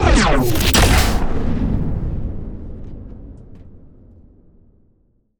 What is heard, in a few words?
Large Sci-Fi Explosion